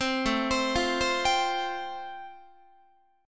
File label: A-Minor 7th Sharp 5th, Guitar, chord; 15,17,15,15,16,18; Am7#5 arpeggiated; JGuitar